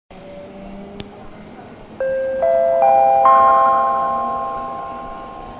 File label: chime; keifukudenkitetsudo; randen